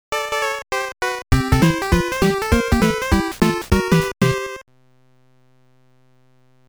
Gameplay Music; Tecmo Bowl; NES

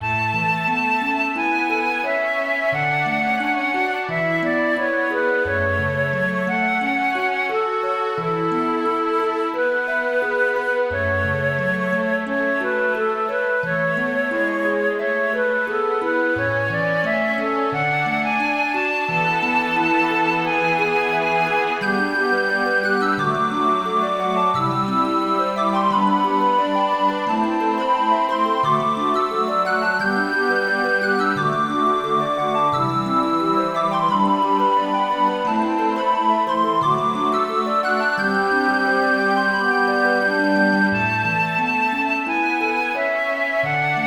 Ocarina Version; Tales of Symphonia; 2016 Windows gamerip; Lloyd

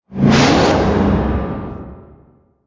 Black Hole Close; Angry Birds Space

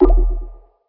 Canister; car simulator 2

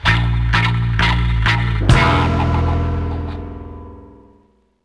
Generic Sting Fail; bully scholarship edition

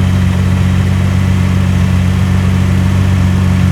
Vaz 07 Low; car simulator 2

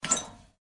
Metal Door Open

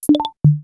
lame button swim

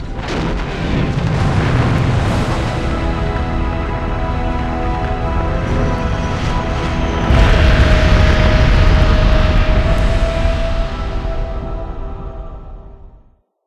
Alien Spaceship Destroyed; assault heroes 2

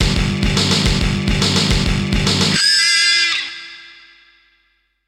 Devil Jingle; captain rainbow; wii

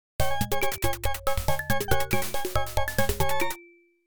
Drive

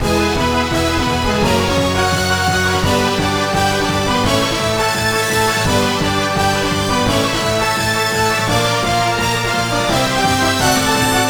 Bonustime; Cookie Run OvenBreak

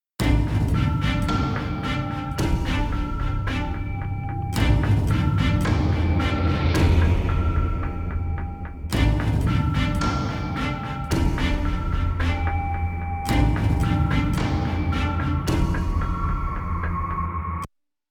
Monster Hunting; Skellige

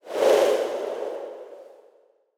Ui Trans To Main Screen Wind; portal quest android

